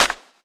Claps & Snares

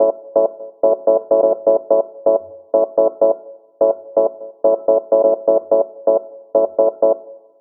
126 F# MUSIC; funky; Loopmasters; Funky Underground Vol 2

